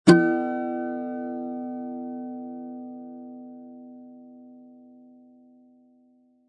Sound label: Cartoon Guitar String Hit, Funny accident impact hit; Comedy/Cartoon - Guitar String Hit: Funny accident impact hit sound with tail; Funny Sounds